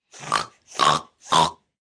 Laugh Snorts, Laugh, 1 child,10 yrs, silly with snorts, human voice, child, kid; Laughter Sound Effects; Sound Effects:Voice; VoiceBox